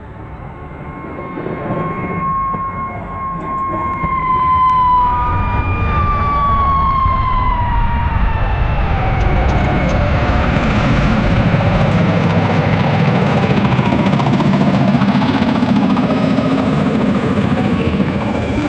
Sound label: battlefield helicopter fl